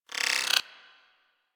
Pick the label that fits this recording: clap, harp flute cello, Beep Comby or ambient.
Beep Comby